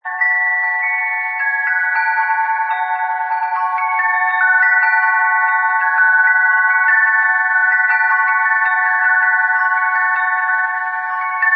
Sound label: winter wind chime